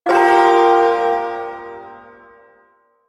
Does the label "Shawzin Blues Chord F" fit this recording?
Yes